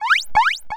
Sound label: Cry